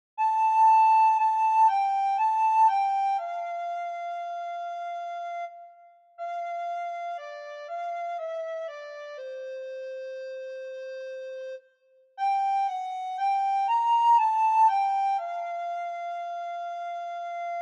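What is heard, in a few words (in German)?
Leise rieselt der Schnee Blockflöte solo; deutsches Weihnachtslied für Sopranblockflöte solo; Leise rieselt der Schnee - für Blockflöte mit Aufnahme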